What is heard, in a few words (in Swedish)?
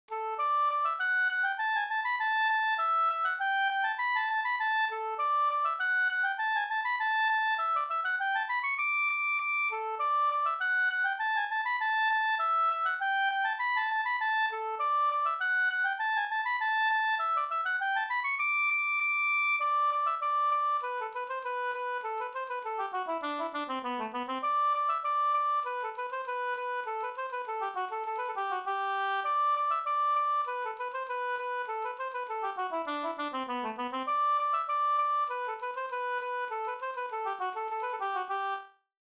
engelska; e August Andersson; Nyckelberg